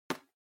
Trackpad (Tap)

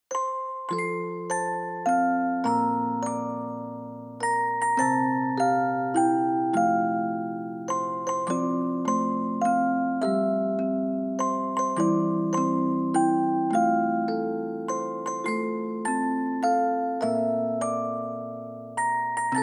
Happy Birthday To You, Reverb, Short

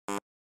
Quiz Buzzer Short; クイズ ブザー; 短

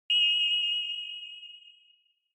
Flash Low Dry; Onoma; オノマトペ 光る; 低 ドライ

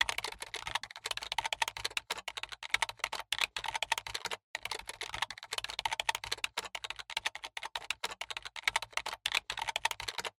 PC Keyboard Ten Key

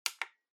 Portable MD Button

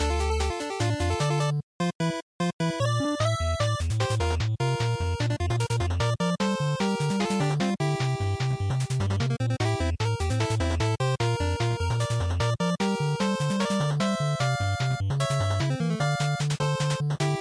Shooter Stage Loop, bgm

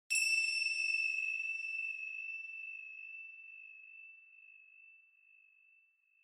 Finger Cymbals Reverb Long

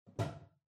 Washer Front Load Far Filter Lower Cover Open

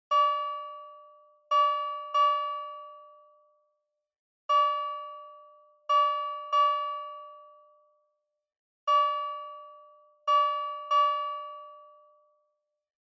Fire Truck Siren Close

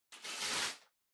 Packing Paper Far Tear; 梱包材; 梱包紙; 遠い 破る

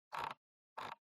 PC Mouse Scroll

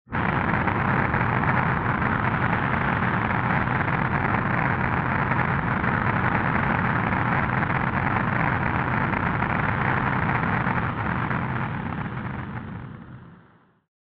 Retro Anime Jet Low Long

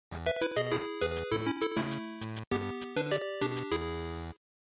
General Miss, bgm

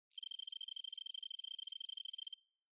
Cyber

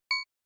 SNES Action Defend

